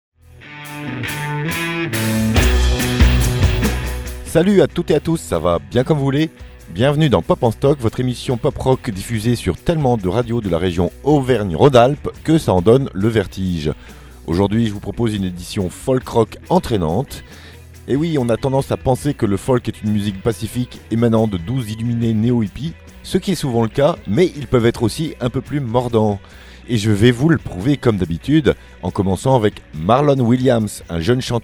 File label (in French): Pop Folk Rock Entrainante